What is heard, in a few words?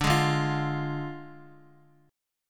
D Diminished D, Guitar; Ddim strummed; x,x,2,1,2,1